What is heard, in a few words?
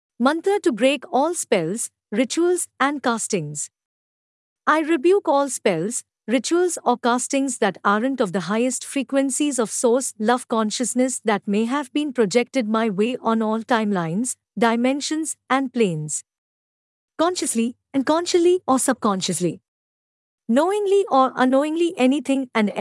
Mantra; To Break ALL spells rituals castings